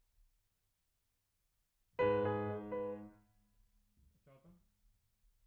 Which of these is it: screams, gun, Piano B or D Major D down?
Piano B